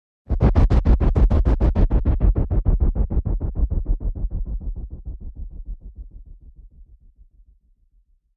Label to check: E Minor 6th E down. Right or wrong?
Wrong